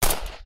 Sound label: gun light; FalloutTower